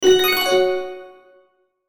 Logo Violin Twinkle; Sonic Logo Sound Effects; Blastwave

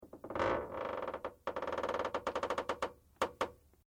METAL CREAK LIGHT; Materials

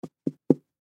Card Stack Table, Card Stack Hit Table; Casinos; Sports; Blastwave

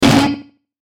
Hit Distortion Static Flutter; Blastwave

